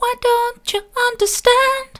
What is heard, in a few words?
why don't you understand soft, vocal